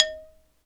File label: XYLO, Afro Xylophn